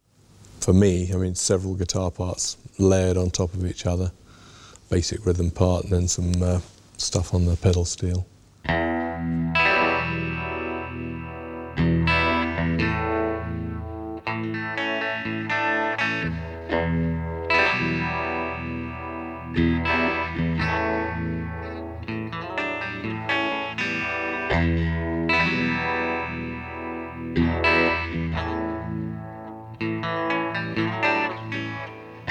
guitars; Classic Albums DVD

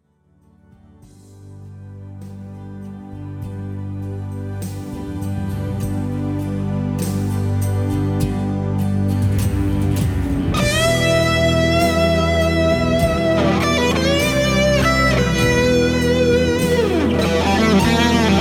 Civil War Big Muff

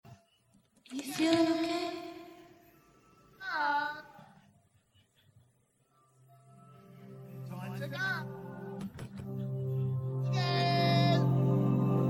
Orchestra channel from 5.1

